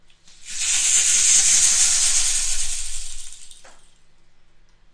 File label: rainstick stick speeding up; ETHNIC and WORLD PERCUSSION